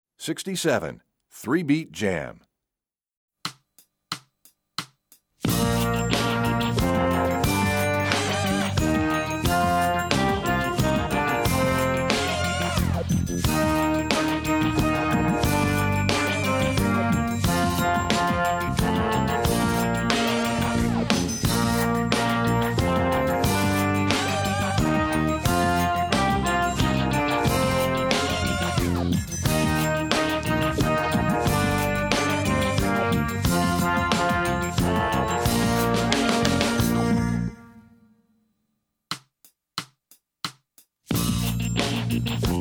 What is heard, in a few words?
Three Beat Jam; EE2000 Book1